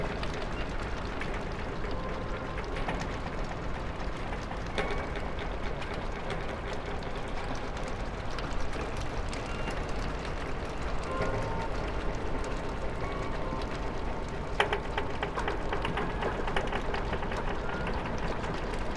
fireman music

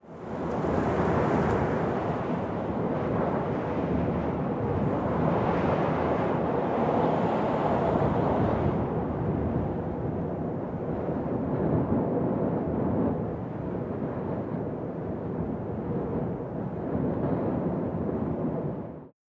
Wind

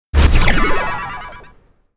Pickups Powerup spewer; SFX; The Binding of Isaac

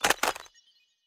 Freeze Break; Going Under

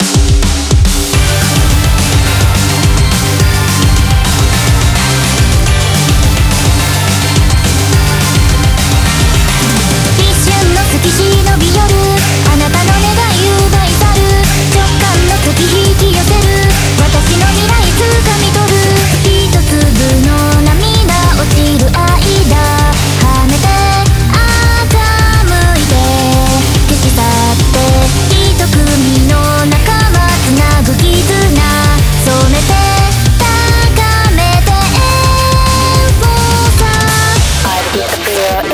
BGM Reach

